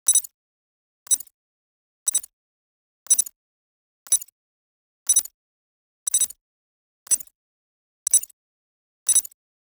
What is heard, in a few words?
Spaceships Systems Digital Timer; Just Sound Effects